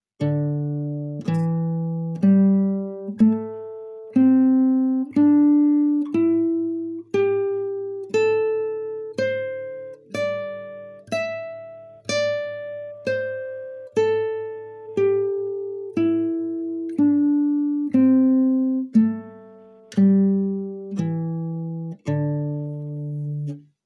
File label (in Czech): Pentatonika